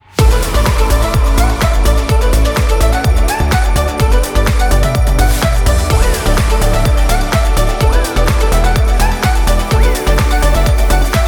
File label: big Win; netent